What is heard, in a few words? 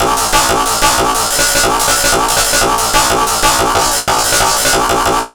amen; Amen Breaks